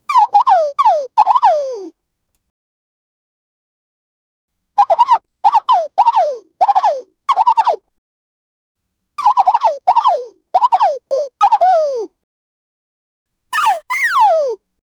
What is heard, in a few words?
cartoon horse; animated